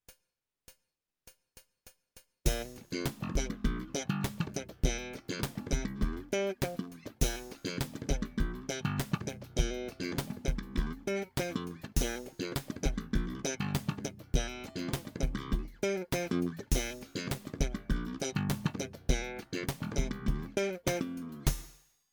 Slap, bassoelettrico

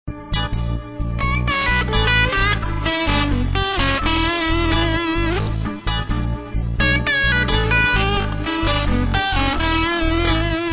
blues

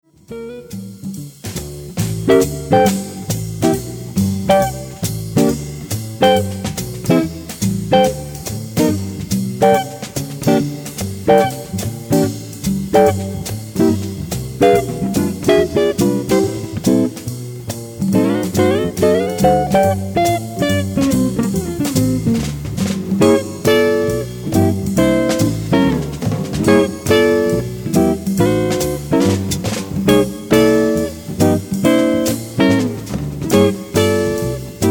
chord solo

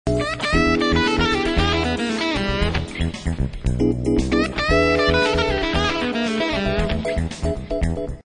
blues

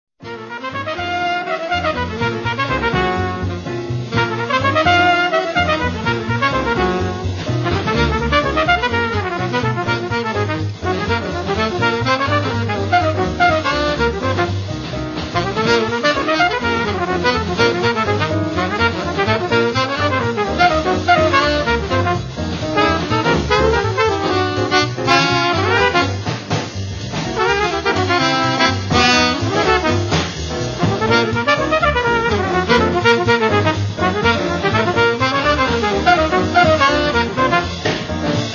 piano

